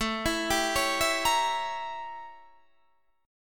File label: A 7th Flat 9th Guitar Standard; A7b9; arpeggiated; capo=2